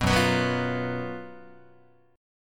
F sharp Major 7th Suspended 4th, Guitar; F#M7sus4 strummed

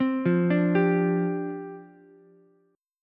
D Minor 11th D down Ukulele C6; Dm11 arpeggiated; JGuitar

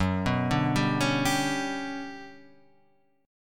G Major Sharp 11th Gb, chord

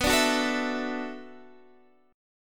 B Minor 11th B down Guitar Open D

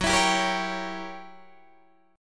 Gb 7th Flat 9th Gb Guitar Open D

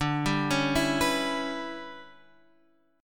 E Minor 6th D, Em6/D chord; arpeggiated; JGuitar

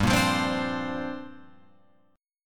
G Minor 11th, chord, Guitar Open F; Gm11 strummed; JGuitar

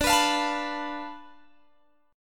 D Minor 6th Guitar Open G

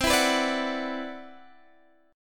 C Major Sharp 11th, C, down, Guitar, Open G

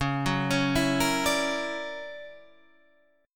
D Augmented Major 9th Db, guitar

